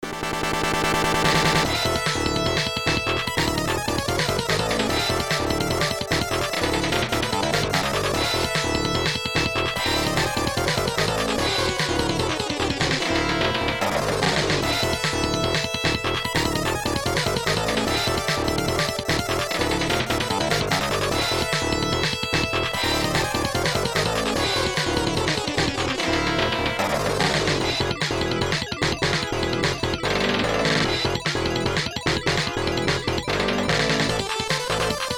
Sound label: Internal Sound Source Version; Granada; X68000